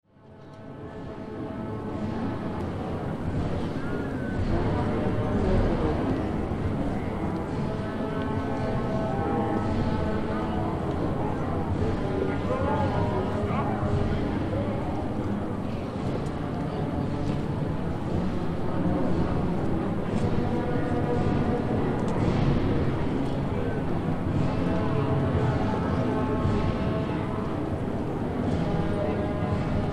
Brass Band in background at celebration in town square lots of crowd; Parade